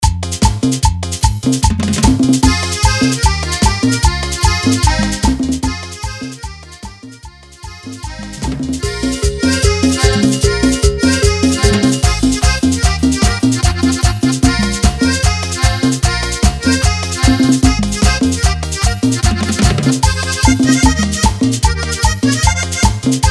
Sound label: Instrumental chicha BPM 150